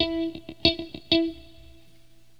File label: GUITAR, ROCK POP; MAGIX